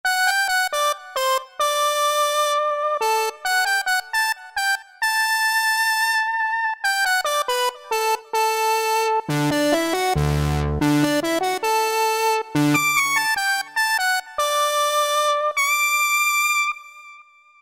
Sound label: Lead; Cheetah MS6